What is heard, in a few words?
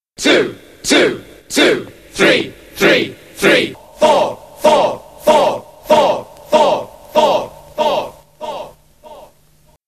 Jingles